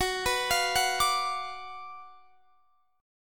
F sharp Major 7th Suspended 4th Sharp 5th, F sharp, down, Guitar, Standard; F#M7sus4#5 arpeggiated